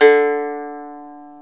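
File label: ethnic plucks; Eth Plucks, Pluck Duo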